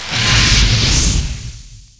elemetal buster fire

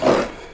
grey wolf die

